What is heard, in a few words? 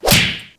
slap hit; gmod